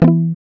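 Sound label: GUI E2, acoustic guitar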